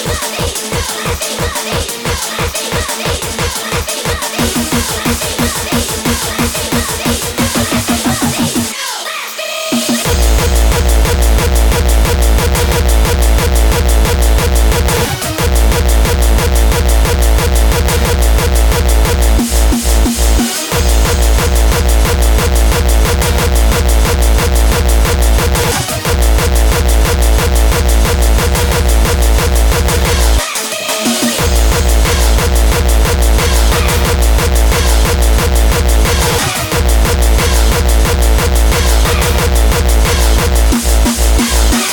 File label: drumz